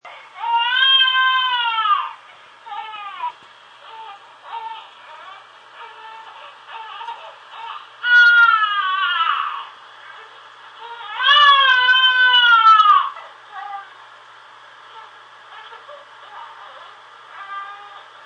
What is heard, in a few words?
Baby Cry Mntor, Baby crying heard through monitor.; Baby Cries on Monitor; Voice